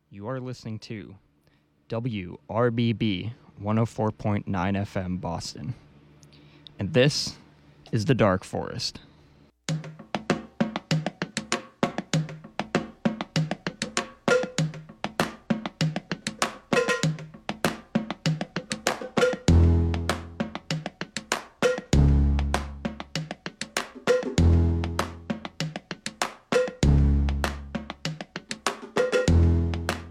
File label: dance-punk